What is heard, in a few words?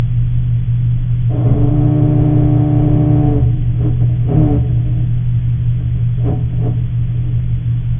Lutjanus griseus Larvae Tank Growl; Grey snapper